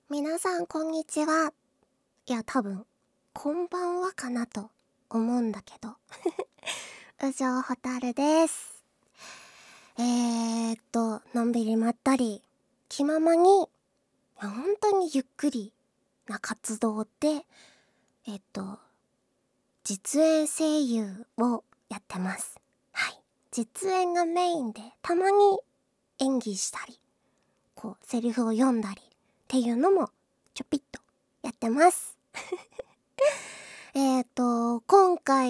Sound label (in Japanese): 美声のお姉さんがチン媚びしながら下品にオナニー; 実演